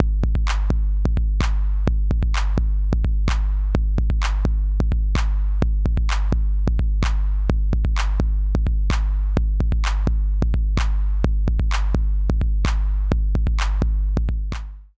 Chandler Zener Limiter TG12413 TR 808 LIMIT SC OFF